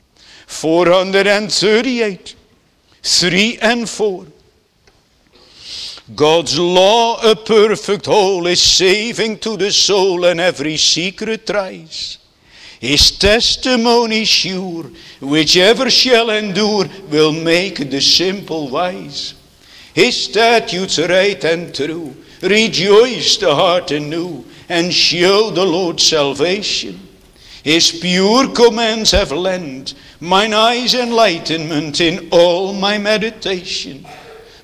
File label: Singing